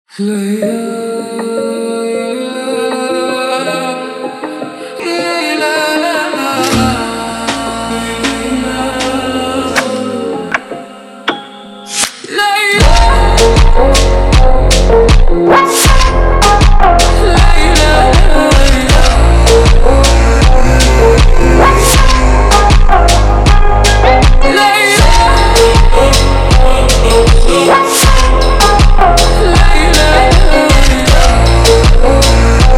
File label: slowed, funk